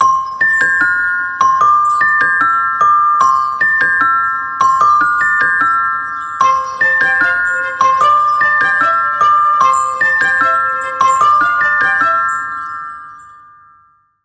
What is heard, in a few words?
New Year Ringtones Background Music; Pond5